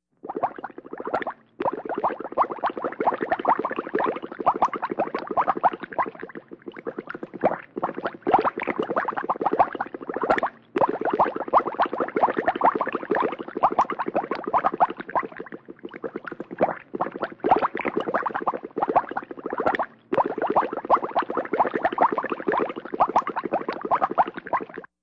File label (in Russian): Bulkanie